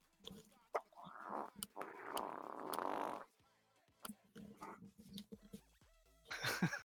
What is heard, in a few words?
fart